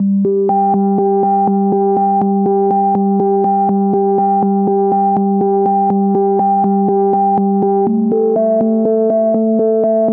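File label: 122 BPM Melodic Arps A major G minor Tonic C; MMB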